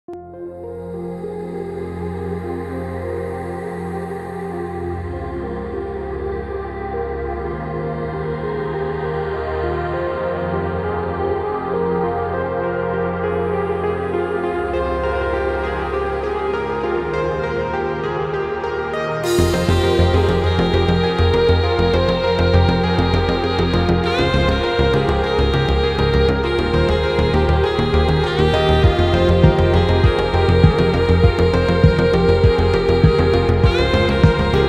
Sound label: deep house